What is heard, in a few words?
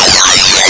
FM Timbre, wavetables; Animoog